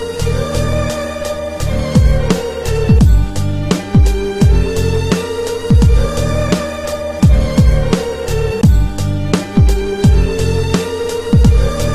BEATS REMIX

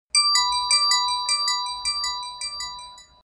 Lg Kg275 Sms

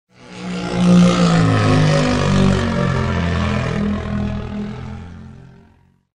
Flyby

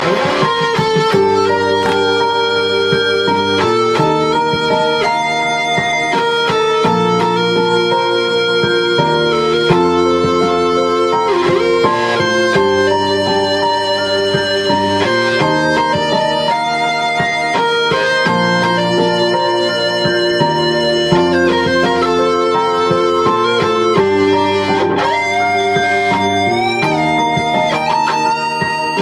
Guitar Solo

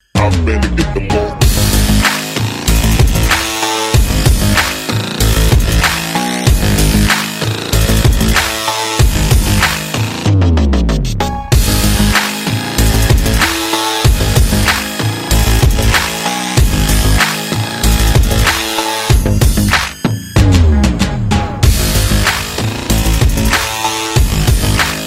drone

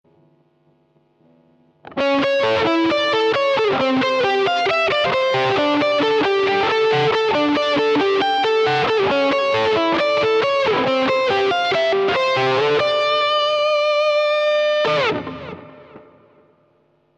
Solo

